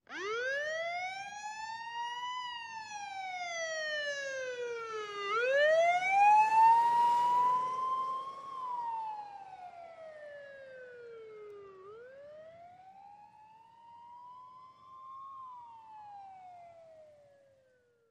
Police Wailer Siren By Right To Left, Away To Distant. Bird Chirps At Tail.